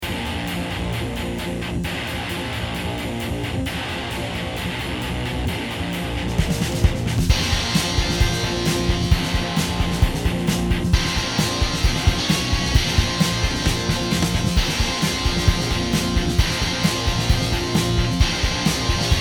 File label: INSTRUMENTAL